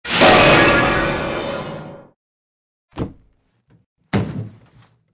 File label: drop piano